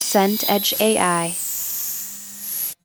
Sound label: scan beam